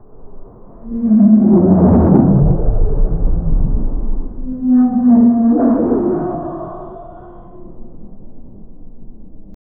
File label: gargantuan creature sound, underwater